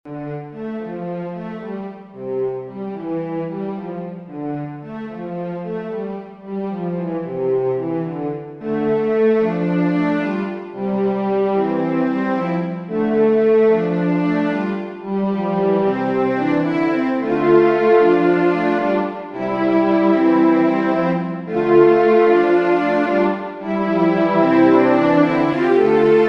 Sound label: Divisions on Ground 4 8 12 cellos; chamber music